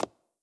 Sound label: Foot Step; blue archive